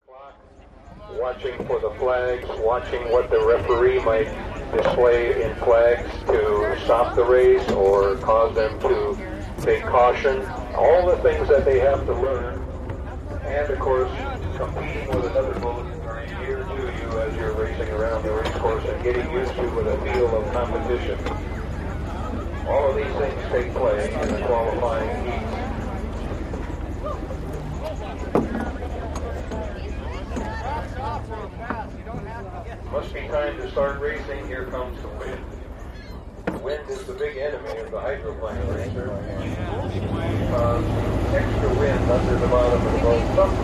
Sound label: Boat Race Pit Doc Amb, motorboats